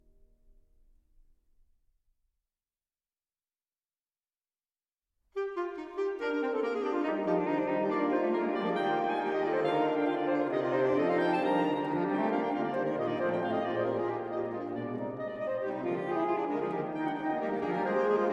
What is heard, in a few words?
SAX, saxophone quartet tape reel to reel; L'imprevu